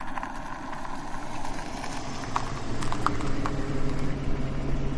Mustang Stp Idl Loop

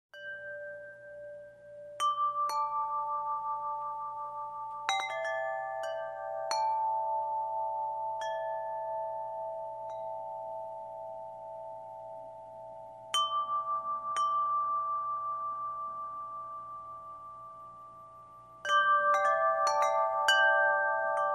Pentatonic Wind Chim, wind chimes

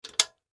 Flip Switch Plastic; switches

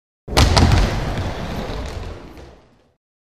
Bowling Ball Bounce

